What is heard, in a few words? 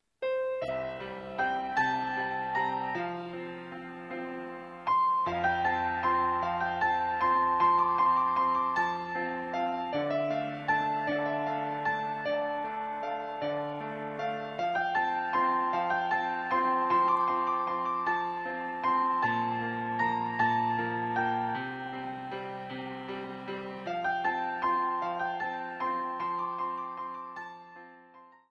Waltz; intermediate